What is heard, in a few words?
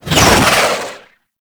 Concrete Archgun Projectile By; Warframe; Archwing